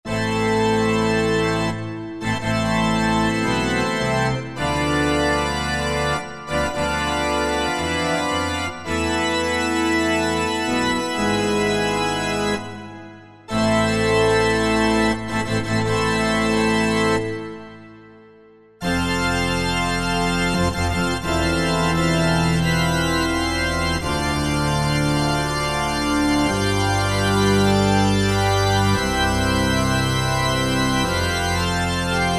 Tutti Pipe; Korg TR-Rack

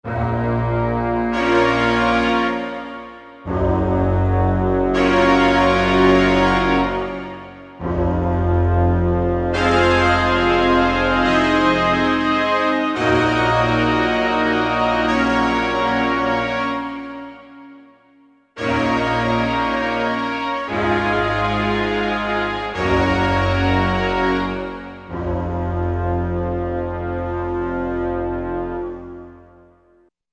Orchestra, Korg MSC-11S Brass

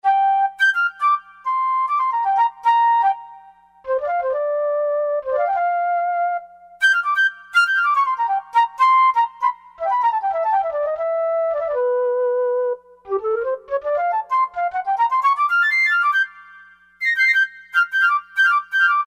Velo Flute, Korg N364; Factory Prog demos

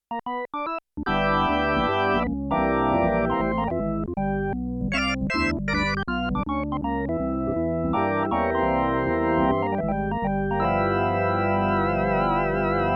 Organ; Rock Performance 2

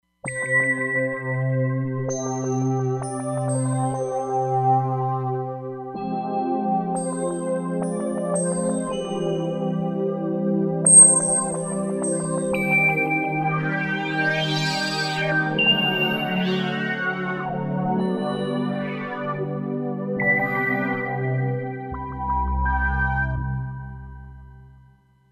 Neuro-Drone, Roland Fantom XR

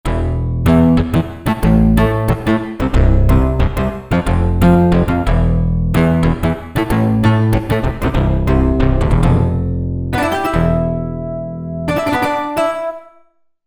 2 voices; Korg MSC-12S Synth 3

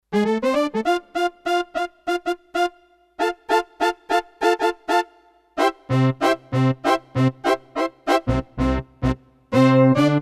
Low Brass, Roland D-10; Factory Patches

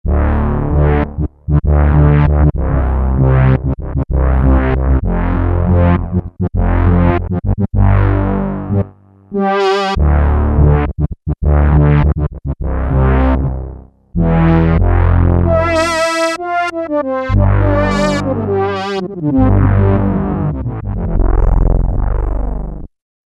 Thin Line, Peavey DPM Spectrum Bass

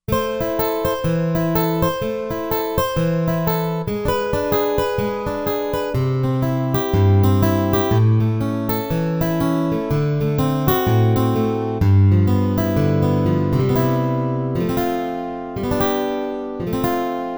Guitar Steel Guitar

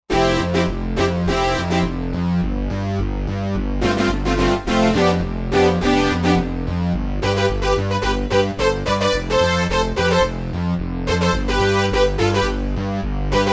Synth Stabs, Korg Wavestation

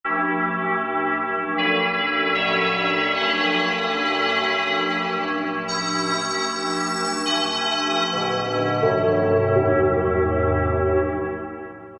Cymbell, Korg T3

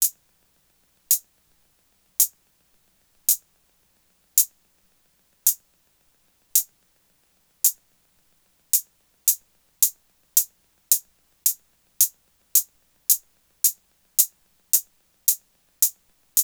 TR-808 Closed High Hat; Roland; Instrument samples

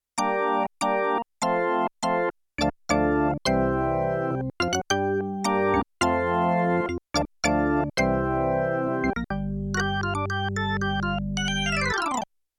Wacked Organ; Rock Performance 2

